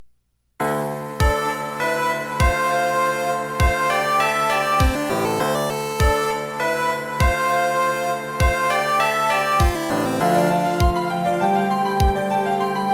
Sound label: trial; ace attorney